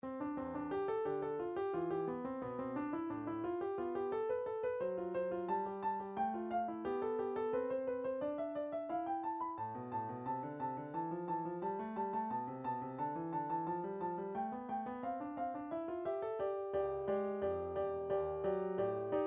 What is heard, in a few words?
ten short piano pieces, midi; shostakovich